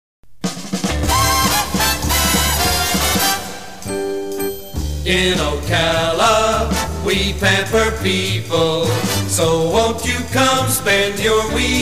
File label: jingle Weekend with music bed; WWKE